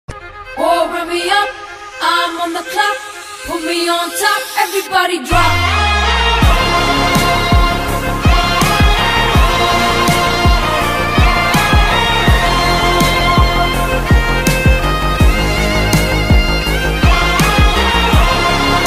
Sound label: theme music ringtone; kalki